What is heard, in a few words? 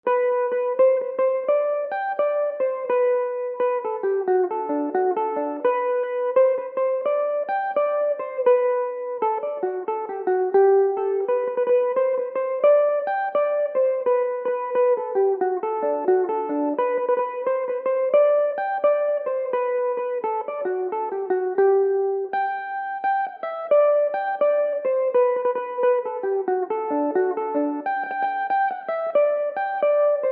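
G major, irish mandolin, tunes; under the willows she is sleeping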